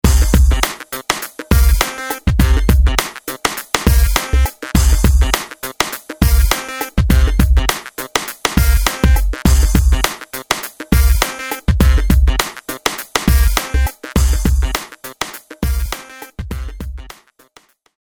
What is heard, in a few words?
EMX Hip Hop